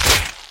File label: headshot; rust